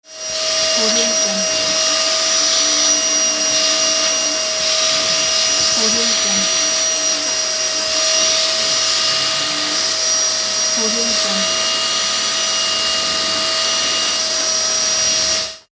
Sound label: the sound of a vacuum cleaner